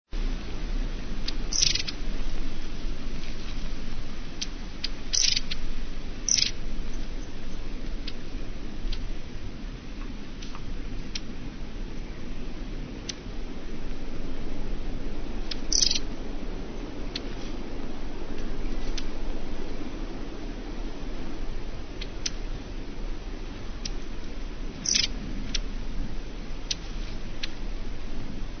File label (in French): Mesange a longue queue, chants